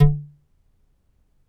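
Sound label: tongue ram, flute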